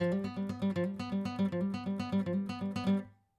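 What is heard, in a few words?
acoustic pattern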